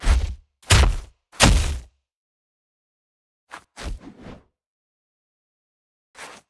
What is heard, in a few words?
emote dragon taunt; LoL; shyvana